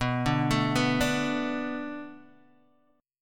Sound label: B Minor 7th B Guitar DADGAD, chord